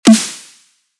snare eq

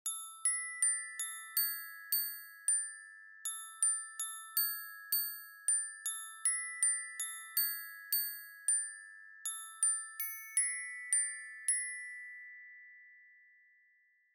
high freq glocken

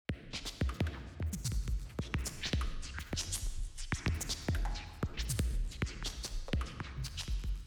drum; portal

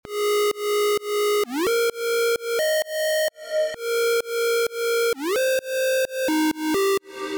lead

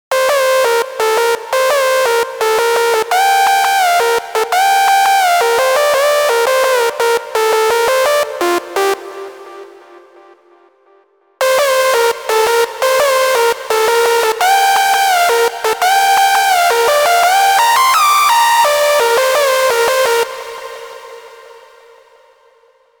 lead sample

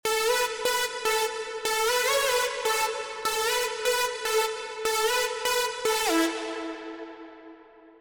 side rev off

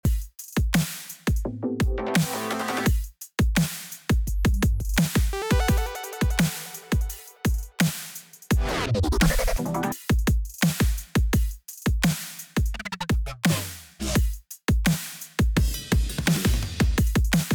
drums

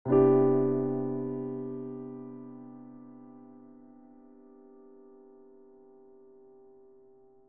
piano; portal

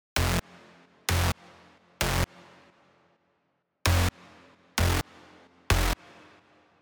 eqt bass

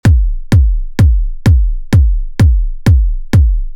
kick trance

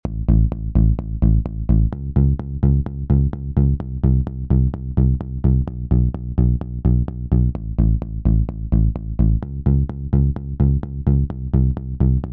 bass

